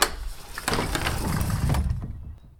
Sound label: Door Open